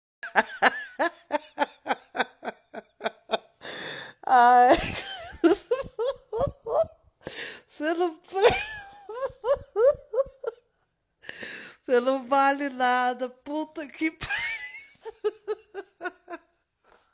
risada

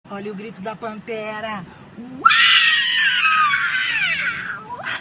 grito certo

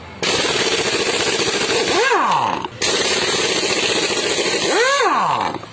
parafusadeira pneumática

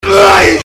submarino explodindo fundo mar

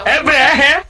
gritando

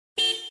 buzina moto

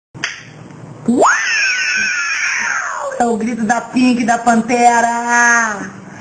grito da pantera; ines brasil

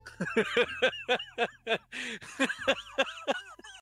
gordo larisada

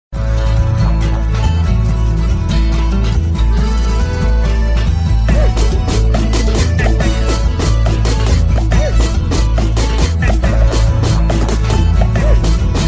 funk; globo rural

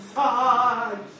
aaaah cortado

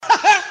risada prof

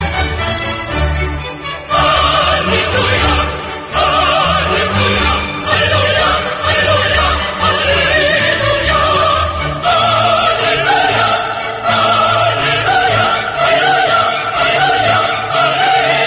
aleluia

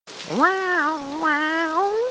viado miando

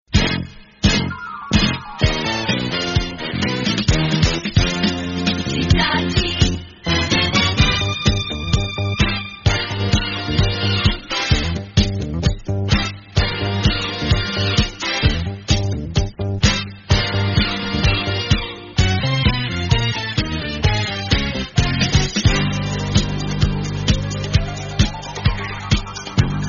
vinheta top of the hour radio; radio cidade

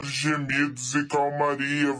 gemidos e calmaria

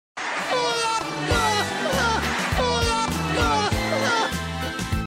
risada com fundo

